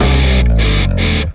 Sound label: Exiting Vehicle, Jingle; The Simpsons Hit & Run; PS2